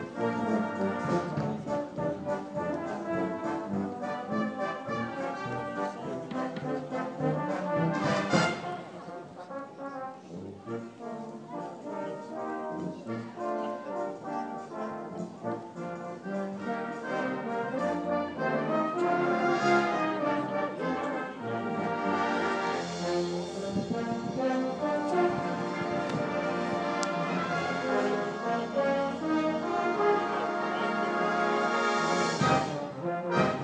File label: brass; forest of dean